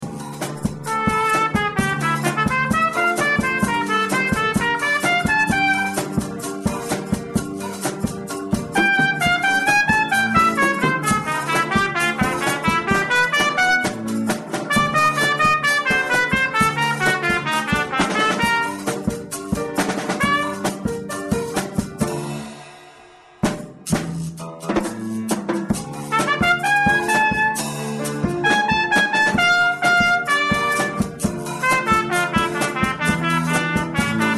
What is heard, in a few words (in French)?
solo trompette